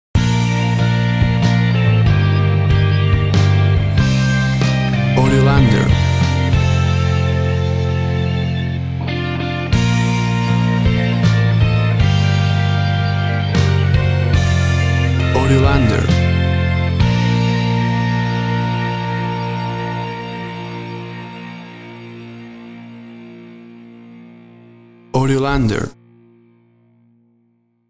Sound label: USA National Anthem, The USA National Anthem